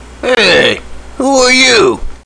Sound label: Enemy Click